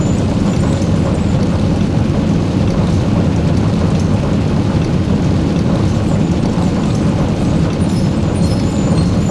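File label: Upper Elevator Weights Loop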